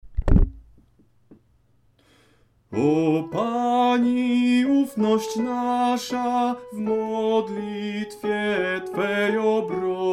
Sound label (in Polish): sopran; O Pani ufnosc nasza